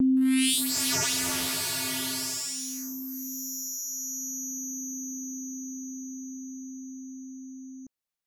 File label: SFX; Urban Flow; Genesis